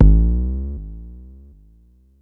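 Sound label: Mod bass drum, Jomox Airbase99, Drum Machines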